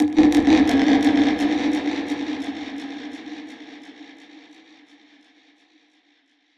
Perc Hit, dub percussion; musicradar

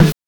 BANK1 SD, NES DPCM; MIDINES

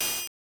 Hi Hat, Genesis; Streets of Rage 1; M8

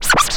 scratch short, Vinyl scratches; Masterbits; Vinyl Attack; scratches mix

